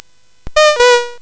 Space Gun

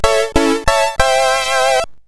Jingles, CSG8580R5 sid

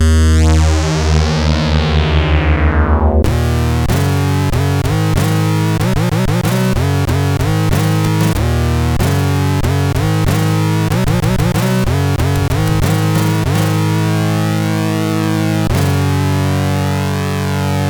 Iron Men, CSG8580R5

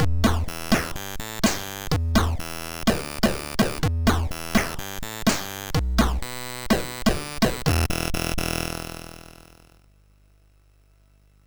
MOS6581; MOS6581R3

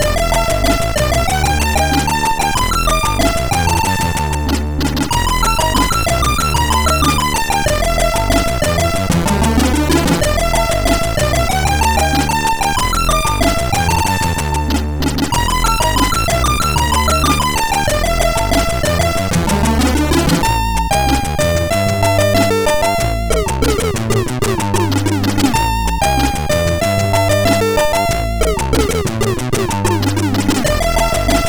Monitor Madness, MOS6581R4

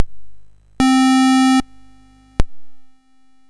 Dragonworld sid MOS6581R4

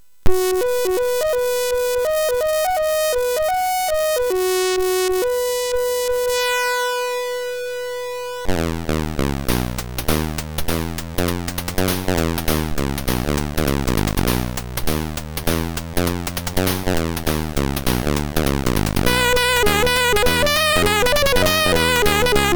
sid MOS6581R3; Bens Music Selector; hvsc

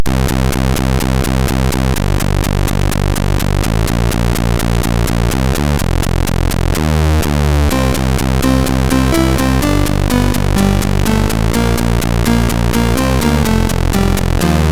MOS 6581; Funkar Bra 2

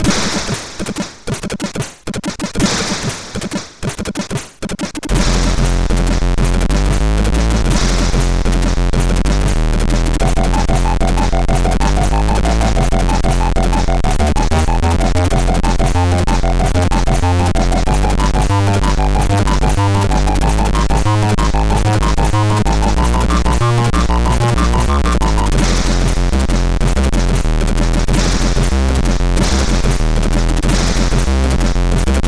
sid, MOS6581R3

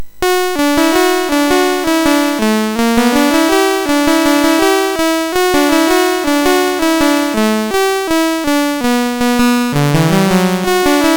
sid MOS6581R3, GAMES; Burning Rubber

